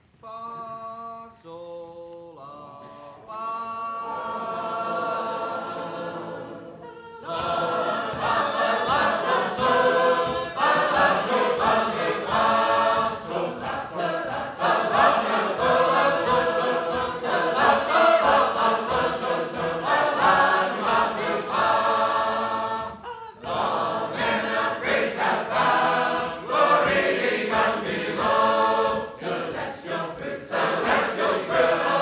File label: Concord, sacred harp